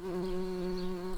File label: bumblebees, Hypnorum workers